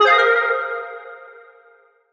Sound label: tonal oneshot drive A#; Pop; Slate; PASADENA Pop Sample Pack; Tonal One Shots